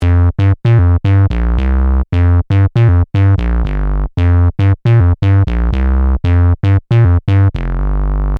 maxi korg bass line

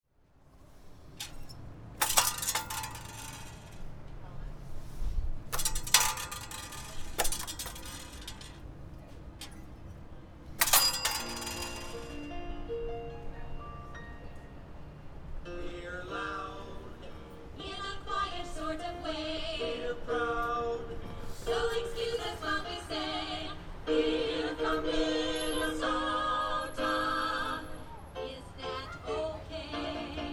song; minnesota

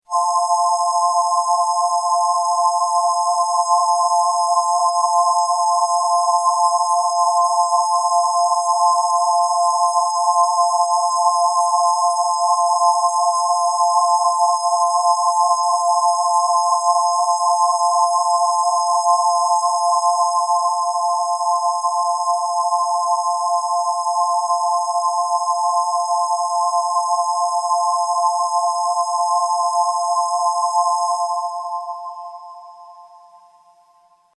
Glassy D50 Pad

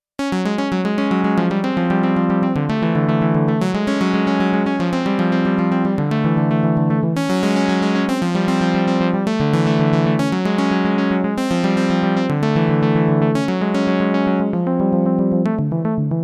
Synthetic Harp; Patch 13

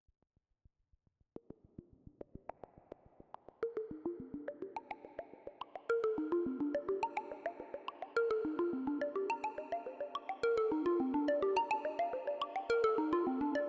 Plucky Arpeggio

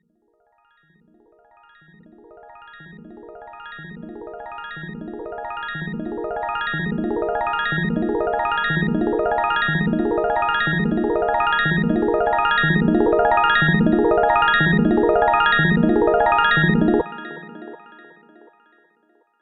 CZ1000 Upward Arpeggio

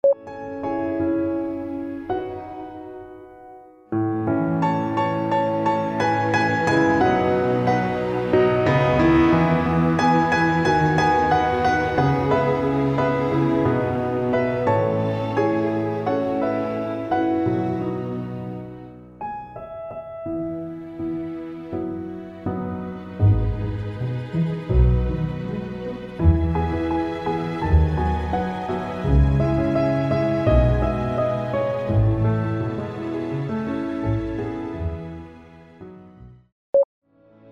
Synth vs Live orchestra examples; Tom Player

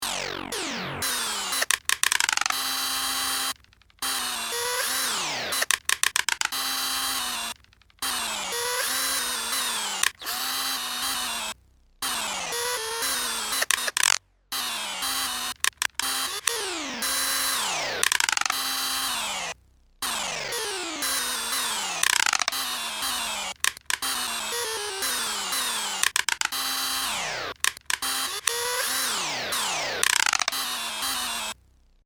power tool percussion

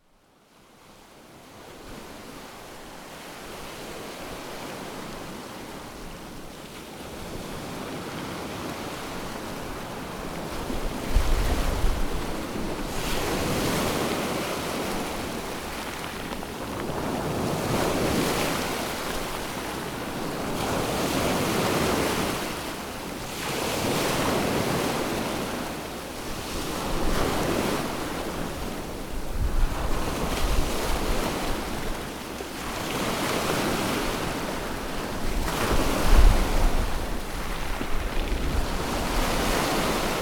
port of indianola surf

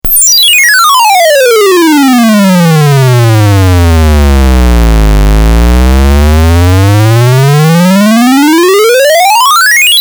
arduino sweep med

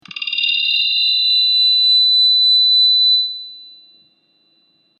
feedback

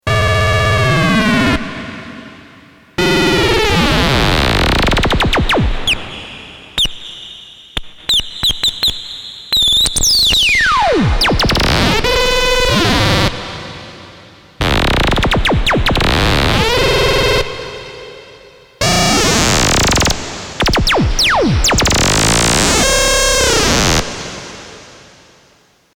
Cutoff Frequency Modulated Monotron

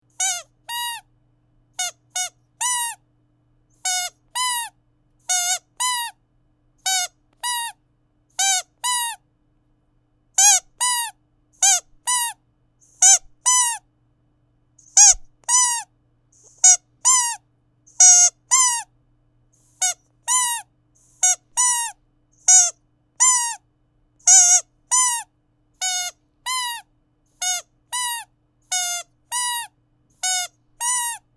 squeaky toy

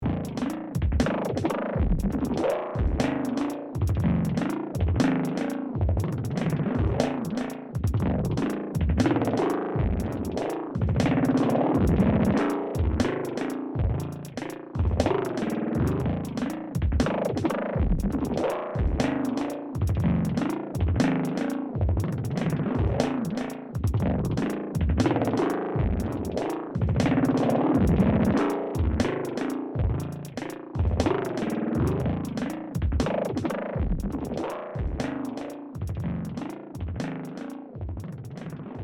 memory man beat

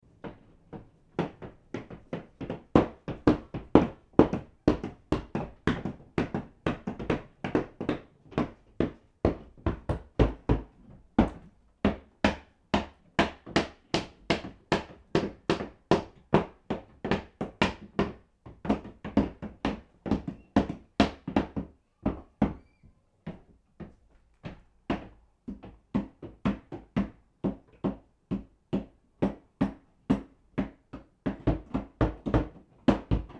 roof racket

